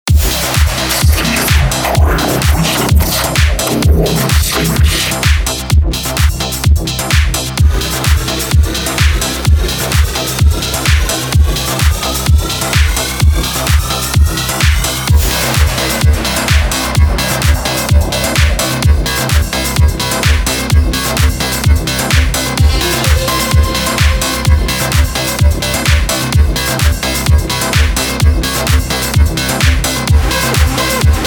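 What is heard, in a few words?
rhythmic leads; the one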